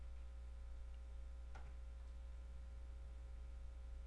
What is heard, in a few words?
beat